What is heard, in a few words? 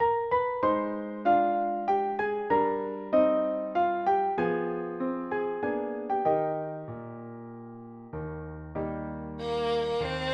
At parting for violin synth; The realisation replaces the voice with violin.